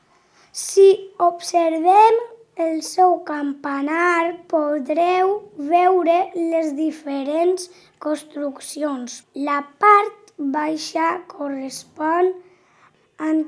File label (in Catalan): Campanar; sant Roc